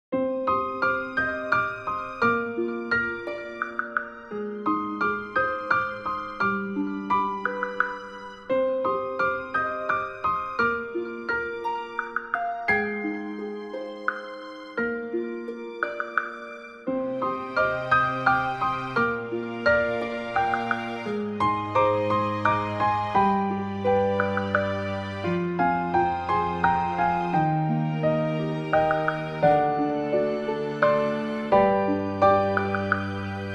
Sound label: sinpitekina kuukan